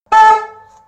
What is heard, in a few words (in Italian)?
caddy clacson